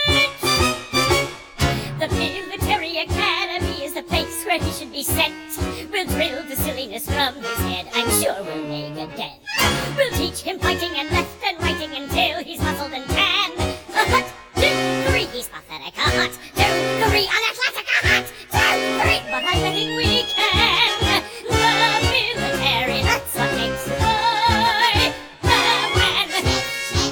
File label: callback vocal high